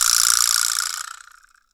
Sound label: VIBRASLAP